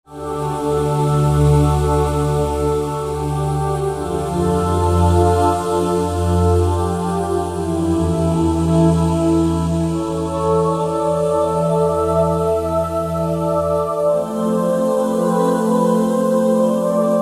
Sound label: Heaven's Vox, Roland SR-JV80-16 Orchestral II